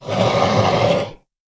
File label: Monster Snarl Growl